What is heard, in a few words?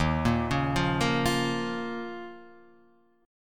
D sharp 7th Suspended 2nd